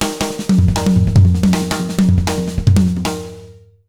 160 B BEAT, Jazz Latin Drumloops

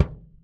Moderate, Kicks; Airwindows

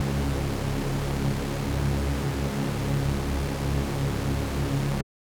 SuperSaw C#3; KG MegaSaur; M8

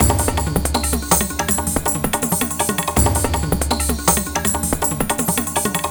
162, Loops, breaks; M8

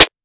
RAP SNARE

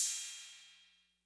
Cymbal Min Decay, 808

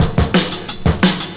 Breaks 175, Drum&Bass; EZ Rollers

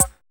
DRM OLD, dance synth

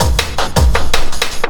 LOOP L, Drum & Bass 160 BPM

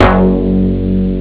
super bass; Amiga Soundtracker Sample Packs

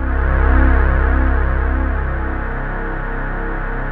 NOIZ SYN; Masterbits; Vintage Voltage; SEQ-FX

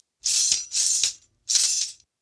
Tamborine Jingles, Arabic; Sonic Foundry (Sony Creative Software); Continental Drift Aftershocks

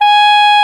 SOP SAX G#5; Greytsounds VOL-1

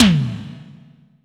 U220 REVE TOM; Greytsounds; Scott Peers Sound Engineering

